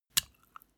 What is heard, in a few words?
step barefoot wet light; schritte